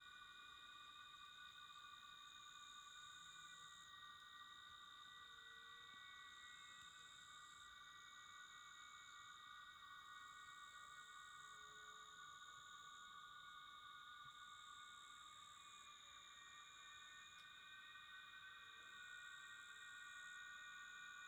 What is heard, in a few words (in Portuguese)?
Coro de Cigarras ao longe na Chapada dos Veadeiros mais perto da noite; insetos